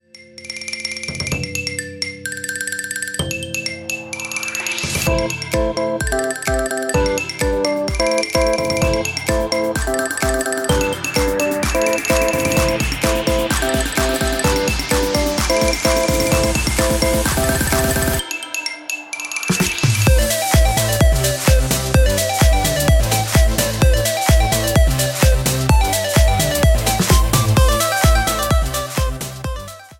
Popular electronic Festival, ringtone

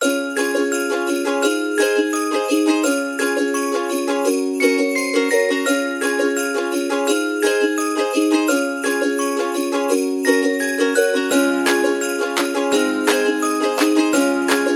Ukulele New Year, ringtone

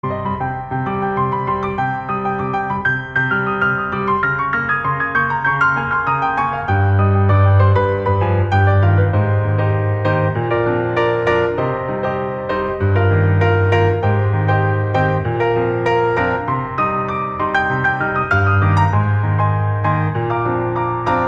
Solo Piano; The Imprudence; MIUI12

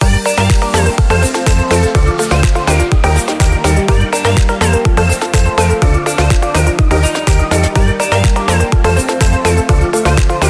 Uplifting Summer Pop (Loop); MIUI12

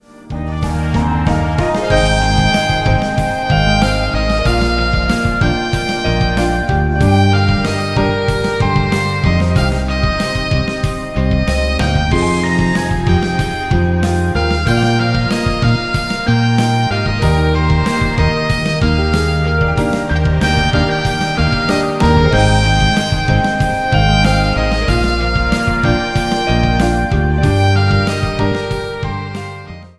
Fun Pop Idol Music; MIUI12

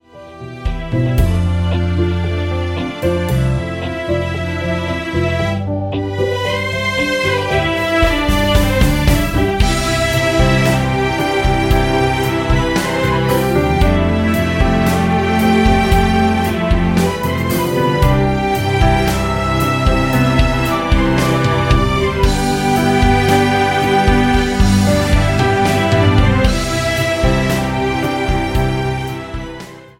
Orchestra: Positive Harmony; MIUI12

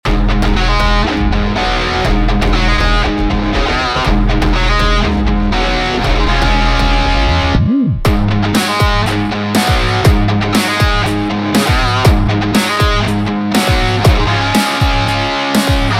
Car; MIUI14 and HyperOS 1.0

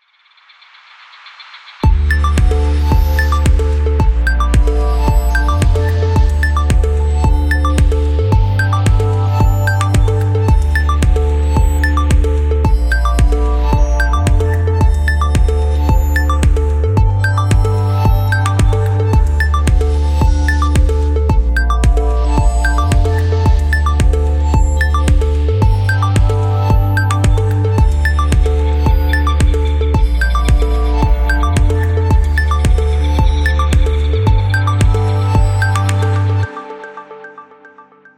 Deep Electronic; MIUI12

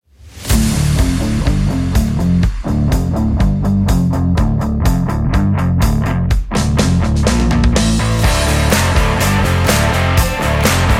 Groovy Rock, ringtone; MIUI14 and HyperOS 1.0

